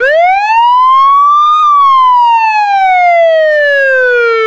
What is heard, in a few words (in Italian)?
sirena